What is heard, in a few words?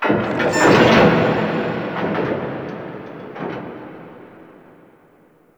Mid Mech, Ambience; Guild; Warframe; Misc Remaster